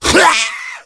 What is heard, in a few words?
smoker pain short, voice; Left 4 Dead 2